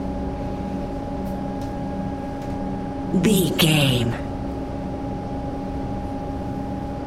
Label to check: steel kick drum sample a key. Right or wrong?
Wrong